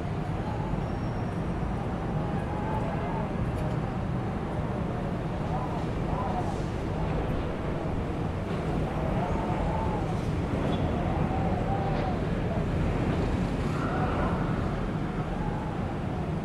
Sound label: Winter Ambience